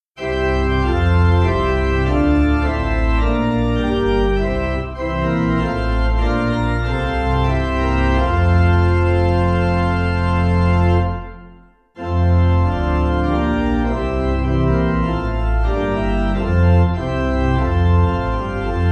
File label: Pipe; The Christ Who Died but rose again; St Magnus; Hymnal 1982